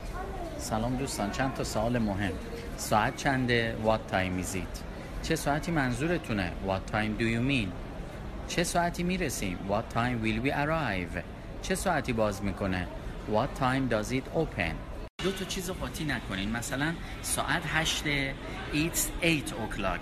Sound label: clock